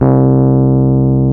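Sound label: piano Rhodes Mk.V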